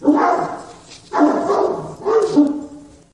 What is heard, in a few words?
Big vicious hounds; SyrinScape; Missing Magistrate